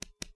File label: Ledge Shuffle Fast; Link shuffling along a ledge; The Wind Waker